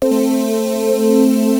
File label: BEES; Q Up Arts; Dream Experience; CLUSTER